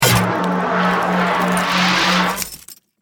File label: Science Fiction Freeze Gun Shoot; Battle; SFX Bible